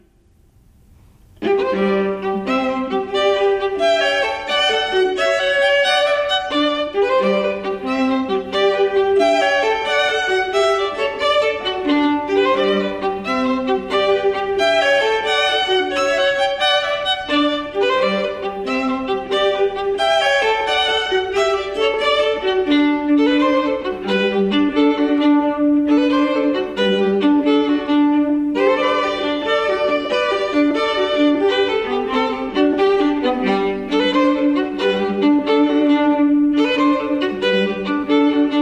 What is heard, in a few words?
Gigue; J.S. Bach